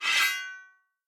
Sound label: minion battlefoley swordscrape; smite; npc; roman archer